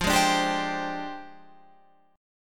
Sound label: F Minor 6th Add 9th F down Guitar Open F; strummed